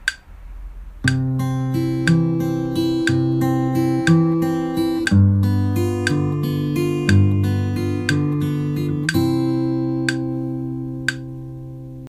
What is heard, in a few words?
arp